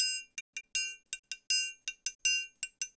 TRIANGLE; Sonic Foundry (Sony Creative Software); Joe Vitale Latin Percussion

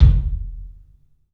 KIK, LIVE CLUB KK, Drums; 3D Sound

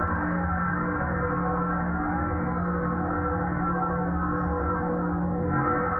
Tonal Re Draw, sparse soundscape

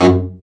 BRA BARI, Bariton Sax

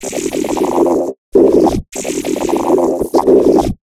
Vox Glitches; Sonic Foundry (Sony Creative Software); Underground UK House & Electro

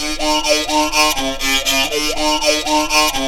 TALKBOX; Synth

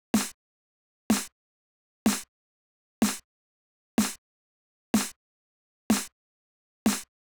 Snare, 125bpm Dm, Nu Disco; Zero-G; Construction Kits; Speedy

